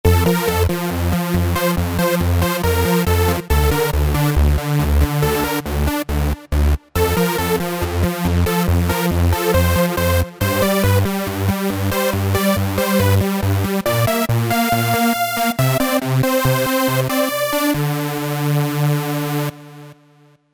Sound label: SYNTH LEADS Cheerful Trance; Apple GarageBand 2